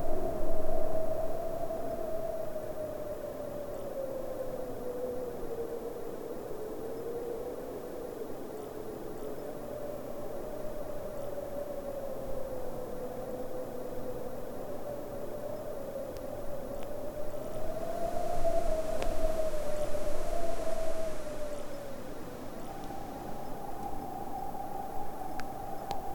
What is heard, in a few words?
ww2 night sounds occasion, history